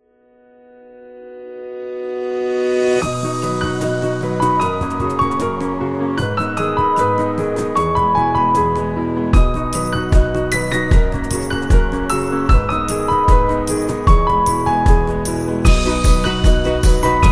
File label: Be Happy, happy music